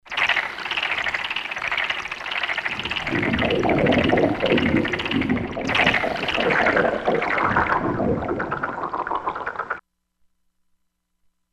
FrogRiv C6; Environment

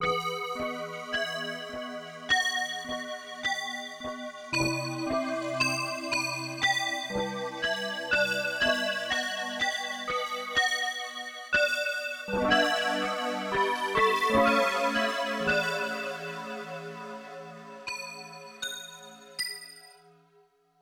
Belltones; Spacey Keys

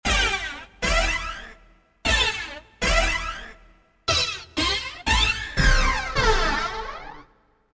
Brass Hit; Roland SRX-05 Supreme Dance

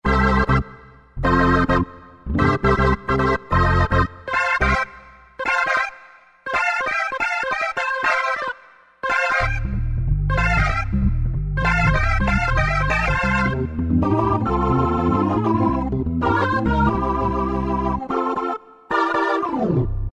Stax Organ, Korg N364; Factory Combi demos

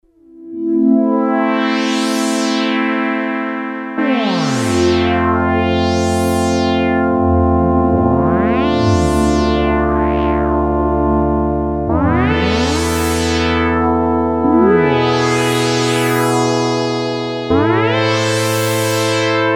Polyglide, Sequential Circuits Six-Trak; Factory Programs

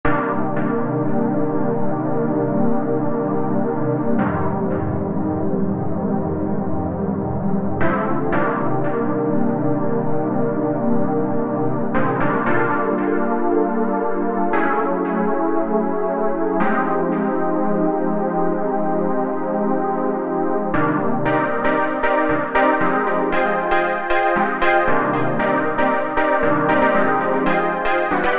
SYNTH TEXTURES Chords; Kotu Chords; Apple GarageBand 2

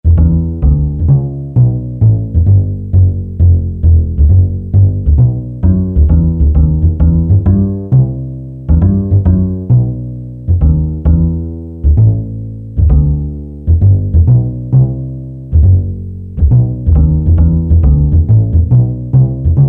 BA Woodbas, Yamaha TG77; Factory Presets

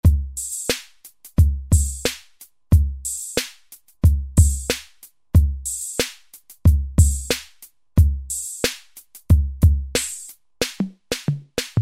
TR-808, Roland SR-JV80-12 Hip Hop Collection

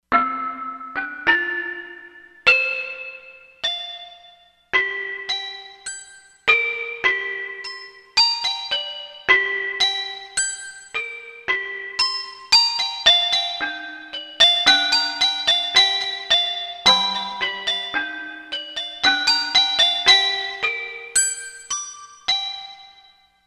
Gamelan, Korg MSC-13S Ethnic 2

